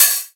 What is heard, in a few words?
Open Hihat